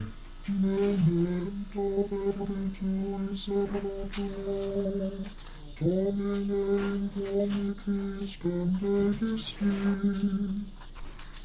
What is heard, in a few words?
comm down an octave